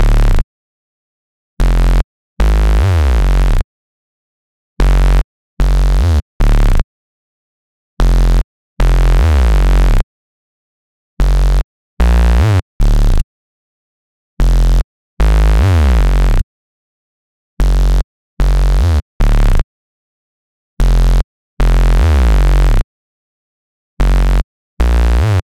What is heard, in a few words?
150 BPM F# Min Bass, Future Bass; Melodies; Loop Stems; Cymatics; GHOST (Beta Pack); Believer